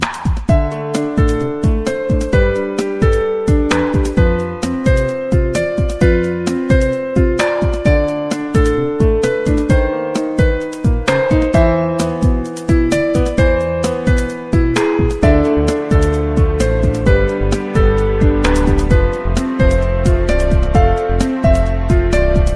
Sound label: piano, ringtone